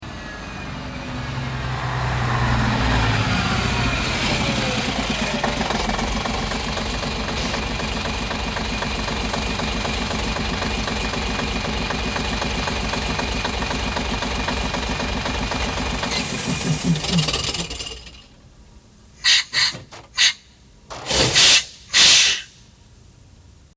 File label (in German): postauto ankunft; Ankunft eines Postautos; Reisepost - Unterwegs mit Kutsche und Postauto